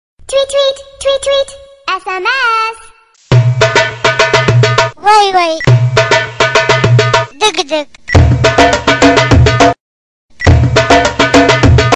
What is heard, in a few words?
Tweet Tweet Sms